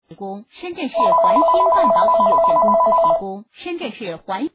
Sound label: RingTone